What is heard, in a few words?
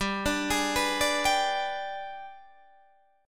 G sharp Minor Major 7th Flat 5th